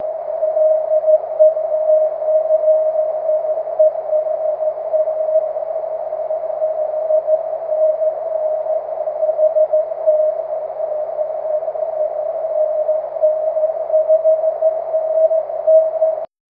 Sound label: cw